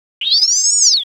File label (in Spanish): Globicefalo filt